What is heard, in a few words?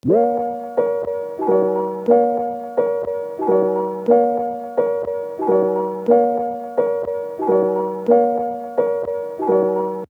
Tape Loop Melody - A#; Tape Loops; Melodies; Cymatics - Eternity Sample Pack